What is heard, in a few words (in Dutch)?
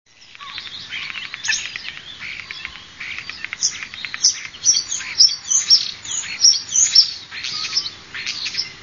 spreeuw